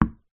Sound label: sport tennis ball bounce single on wooden floor; zapsplat